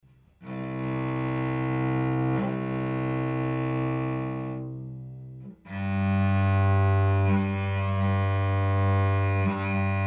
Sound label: open strg, cello